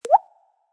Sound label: chime